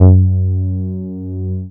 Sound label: BASS MINIMOO